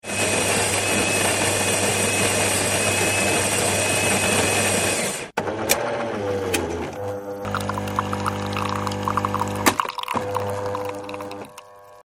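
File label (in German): Haushaltsgerät Espresso Maschine; elektrogeraet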